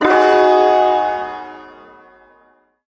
Ersatz Shawzin Yo Chord E; Warframe; Duviri

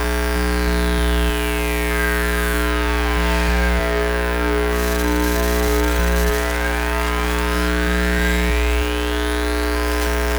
computer terminal loop; Ambience; Props; Warframe; TheNewWar; Zariman

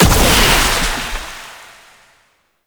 Incarnon Wrist Gun Alt Impact, Weapons; Warframe; Tenno

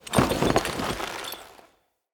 Idle Screen Foley Slow; Kuva Lich

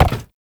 Step Glass; Warframe; Uriel

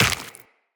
Run Flesh, Footsteps; Tenno

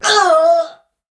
Death; Warframe; Tau; Dax; Ninja

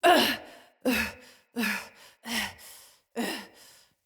Hallway Transition Vocals Female; Cinematics; Bromius; Envoy

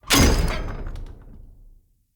Chop Entrance Impact; Sinecure

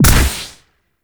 Pistol Proj Explosion; Operators; Drifter

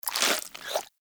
Armadillo Tongue Move; Warframe; Characters; Hunting Critters

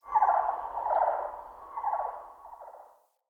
Prairie Day Distant Bird

